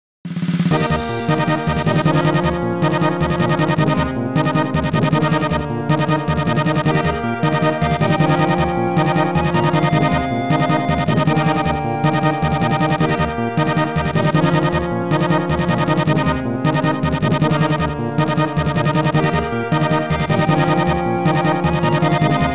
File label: Opening (without drum roll); F-Zero 99